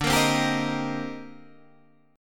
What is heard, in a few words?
C Minor 7th D; Cm7/D strummed; JGuitar